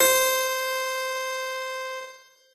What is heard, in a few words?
piano; pianojs